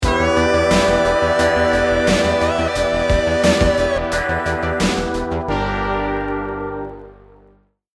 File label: lost round, music; hotlinemiami